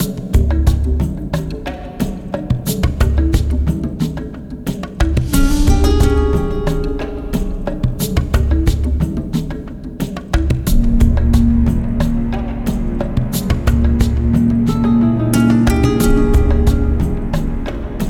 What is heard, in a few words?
Spanish Strings